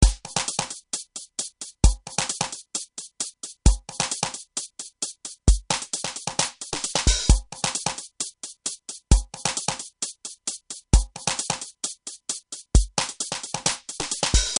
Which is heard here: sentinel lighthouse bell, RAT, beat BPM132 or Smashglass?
beat BPM132